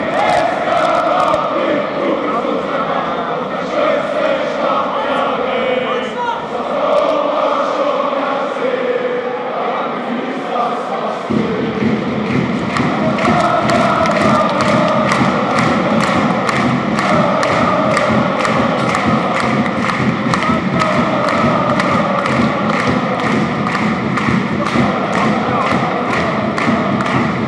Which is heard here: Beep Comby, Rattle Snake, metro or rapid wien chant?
rapid wien chant